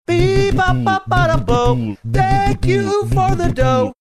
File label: singing be bop bop ba da bo thank you for dough mouth tympani